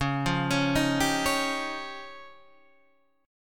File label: F 7th Suspended 2nd Sharp 5th C sharp